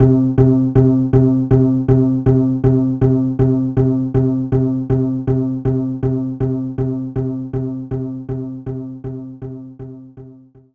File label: pizz C2